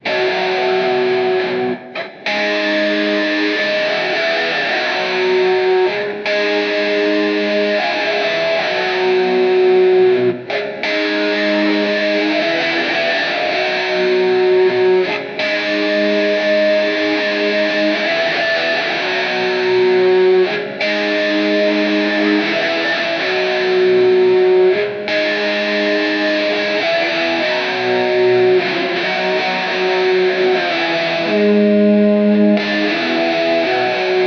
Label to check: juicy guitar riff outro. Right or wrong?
Right